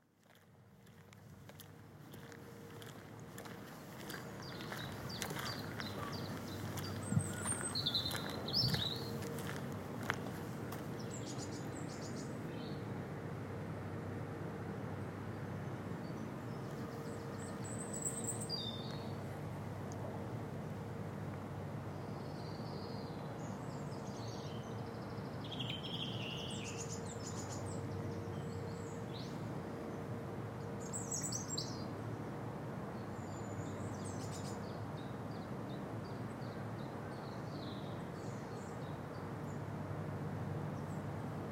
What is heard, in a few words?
Newport Wetlands Soundscape